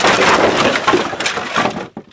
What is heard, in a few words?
crash; Ri Li